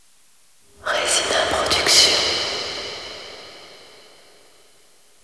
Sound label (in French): logo son Stereo